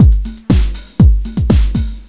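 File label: drum loop; Descent II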